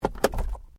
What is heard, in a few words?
Glovebox Open, car; Hyundai Accent 2008; Hyundai hire car; Vehicles